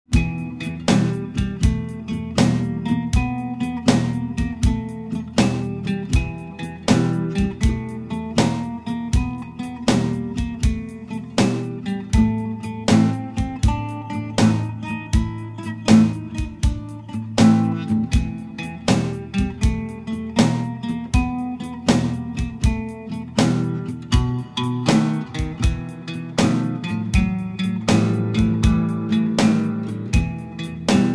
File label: blues